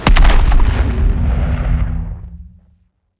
spawn pop; fodder; archvile; demons

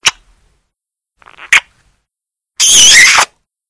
kiss

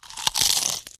zombie chomp

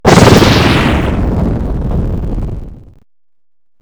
boss half health

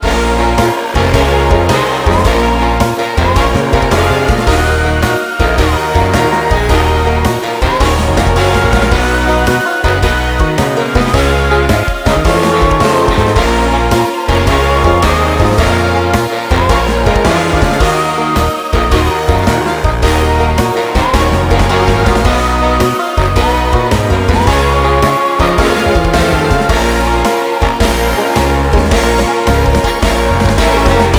victory song

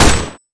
shoot; bushranger

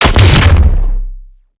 shotgun fire

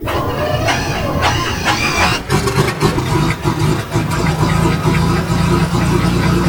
charge windup; bigheaded behemoth

